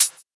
dry high hat c sharp key, Royality free pedal hi-hat sound tuned to the C# note. Loudest frequency: 9687Hz